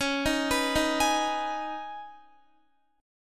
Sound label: Db 7th Suspended 2nd, Guitar; x,16,15,16,15,16; Guitar-4F,4A,5C,5G,5C,6E; Db7sus2 arpeggiated